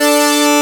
HI OCTAVE; E-MU Formula 4000 Series Vol. 2

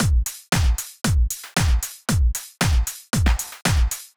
Drumloop 115bpm, Nu Disco; Zero-G

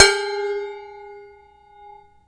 BOWL RING; PERCMETALIC; Rarefaction; Poke in The Ear With A Sharp Stick

